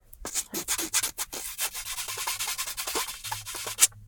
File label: billliards pool applying rubbing squeaking chalk to cue stick; sports sport; fvtt sonniss gdc audio